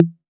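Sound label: 808 snare drum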